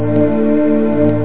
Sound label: Swirl; Swirl 6; Instruments